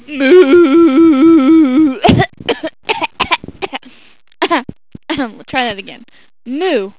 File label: moo